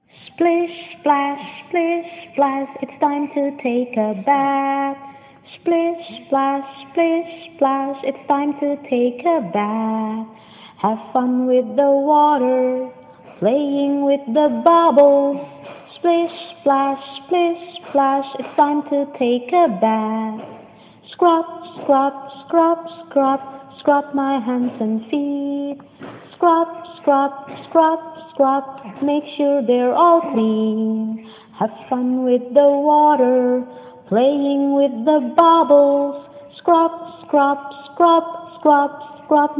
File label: splish splash